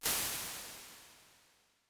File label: Silver Plate, Reverb; Big Gee's